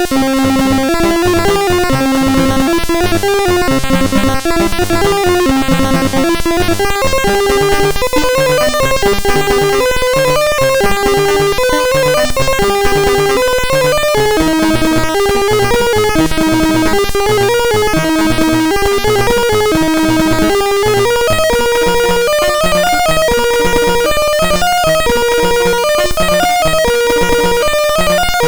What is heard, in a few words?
Randomly generated music BASIC, sid MOS6581R2